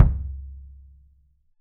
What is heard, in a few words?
RUBB KICK; 3D Drums